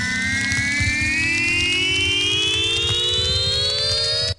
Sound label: charge up, zaps and beeps